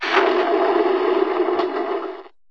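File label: enemy reinforcement artillery; War Thunder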